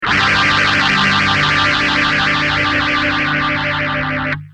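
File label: guitar, Maestro phase shifter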